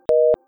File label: Fast Busy; CiscoSparkLauncher